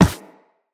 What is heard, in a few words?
Run Metal, footsteps; Characters; Operator